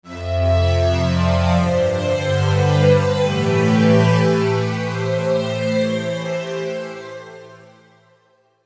saw pad LA, In this synth string, the effect of the PW is emphasized by LA-SAW.; V-Synth